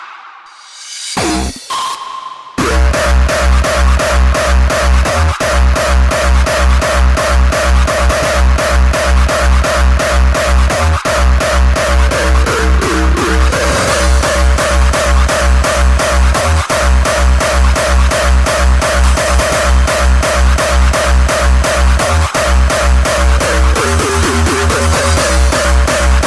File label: saw